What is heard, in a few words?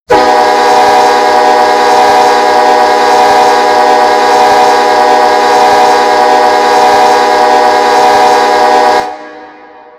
k5lla, horn; run 8 v3 horn pack